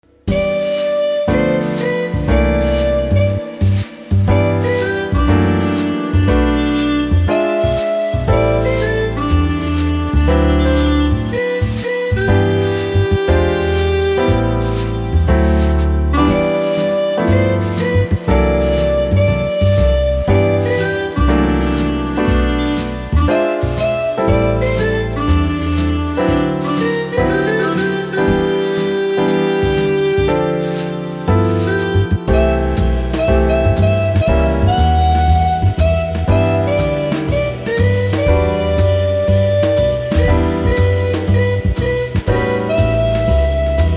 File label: Jazz